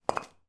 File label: foley breeze block drop on hard ground